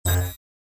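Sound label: Hi tech delete button interface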